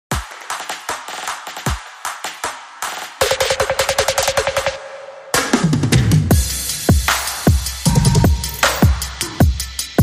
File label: Drums Only